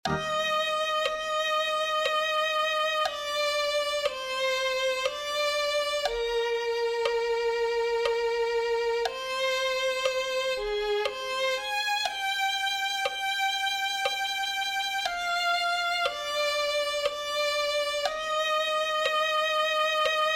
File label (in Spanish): muestranos tu gloria señor violin